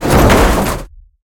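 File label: bulk movement landing; Smite; Cabrakan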